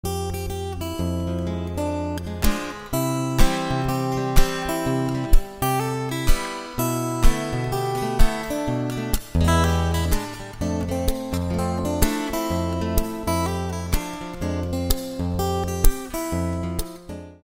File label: guitar fingerstyle cover